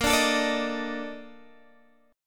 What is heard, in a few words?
Bb Major 11th Bb down Guitar Standard; strummed; JGuitar